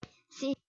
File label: sea